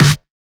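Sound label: Snare Heavy; Zero-G; Soundclash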